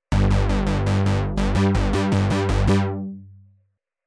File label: bass